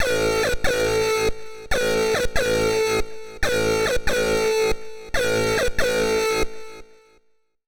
HARD EXTRA 140, Hard Trance

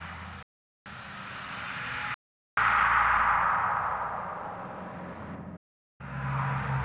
SPACE FX 140; SpaceSounds

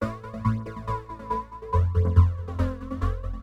TEKNO 140; Techno2

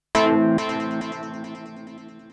KEY SHOT TEKNO 140 A; Techno2; Singleshots

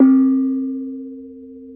BONNANG

